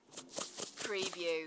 Salt shaker, shake salt, sprinkle salt

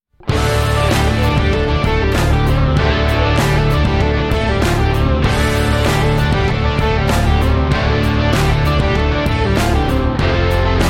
texas rocker

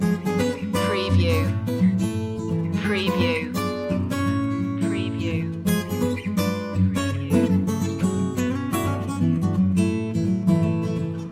bar room rag loop

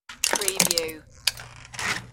Can Twist

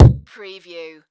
Impact Hard

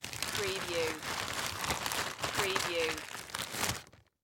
Plastic Bag Remove

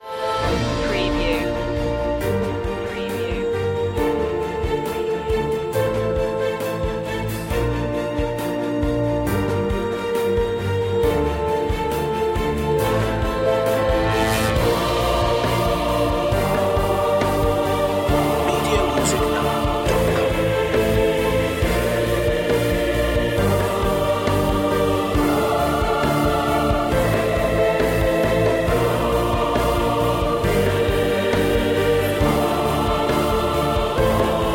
the fountain of life with choir and solo voice

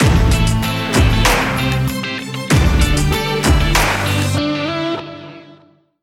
lavish lifestyle stinger

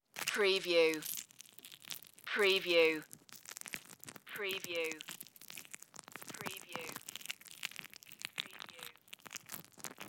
intestine squeeze

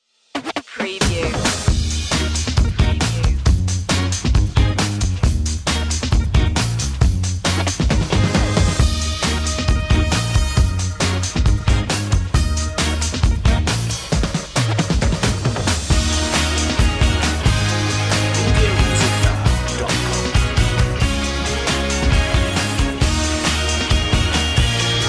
the funky stuff

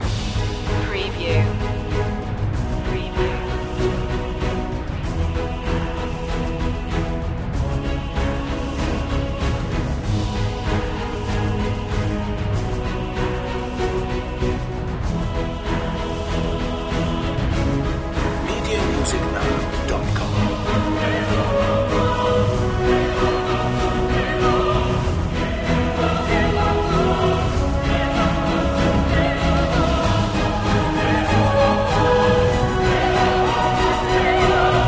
full mix

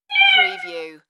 Cat Meow

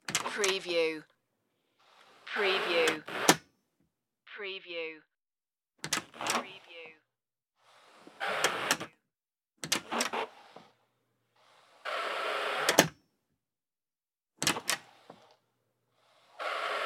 modern heavy wooden door

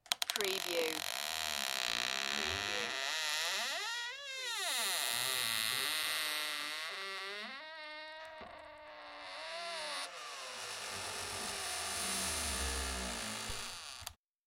Door hinge squeak; beanstalk audio

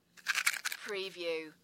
Match box, shake matchbox

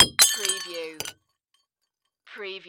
Glass Bottle Drop

